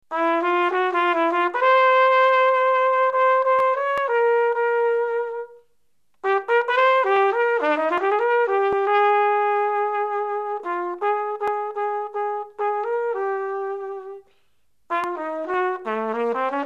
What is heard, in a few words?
bugle